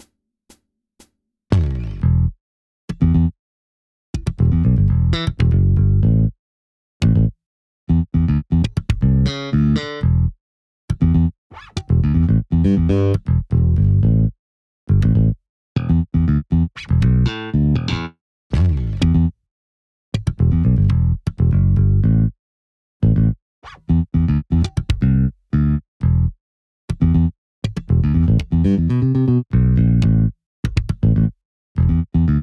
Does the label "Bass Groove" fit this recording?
Yes